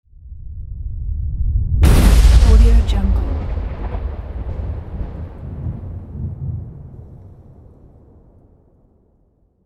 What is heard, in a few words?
lightning